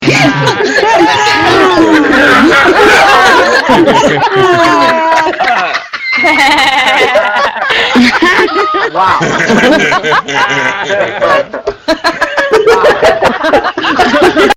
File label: laughs